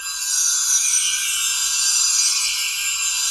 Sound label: GHOSTS; Pro-Rec - Synthsonic